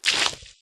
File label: car puddle splash; lightning speed